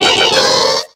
Grito de Illumise; Actualizando grito en la sexta generación (XY)

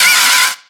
Grito de Venipede

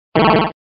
Grito; Feebas

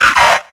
Grito de Cacnea

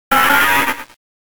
Grito de Loudred

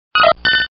Grito

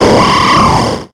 Grito de Armaldo

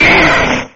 Grito de Growlithe

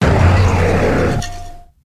Grito de Bramaluna

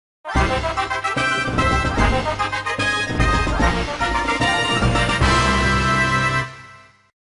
Tema de victoria; Mario y Luigi; SSB

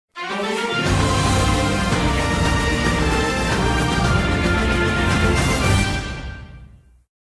Tema de victoria; Lucina, Daraen y Chrom; SSBU

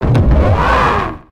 Grito de Carracosta

